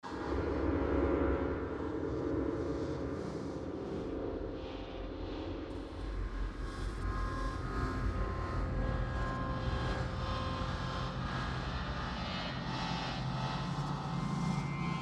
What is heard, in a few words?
Eerie Swells